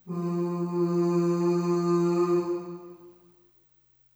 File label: Ooh Short F2 Short Reverb; Harmonic Building Blocks